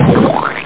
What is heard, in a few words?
squelch; bump n burn